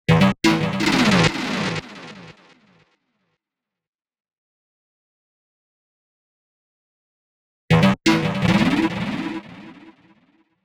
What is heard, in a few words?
126 B#m Synth, Complex Electro Synths; Prime Loops; Biomass